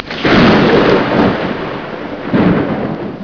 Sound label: thunder; mk2